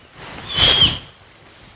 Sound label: Hawk